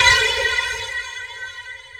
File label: Sample, Roland MV8800, BREAKWILDKIT